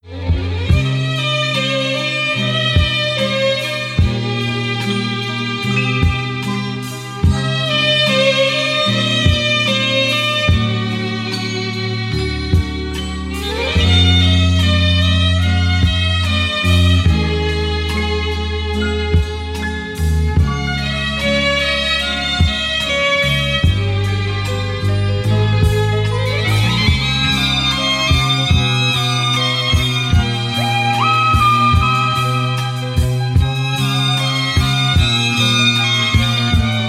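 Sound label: fairy-tale melody; skazochnaya melodiya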